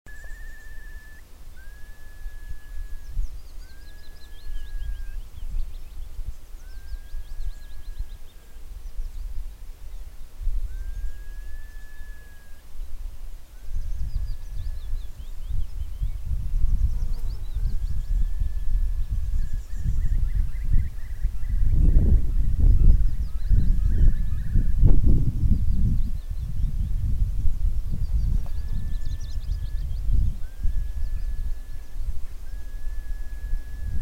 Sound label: curlew